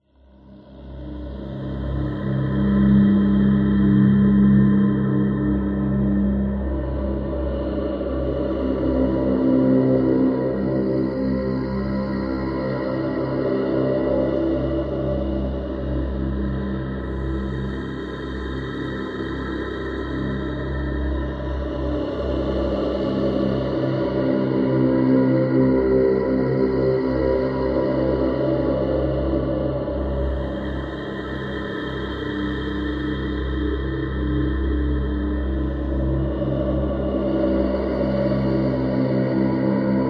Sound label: science fiction atmosphere dark eerie mysterious breathy